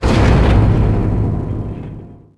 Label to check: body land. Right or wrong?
Right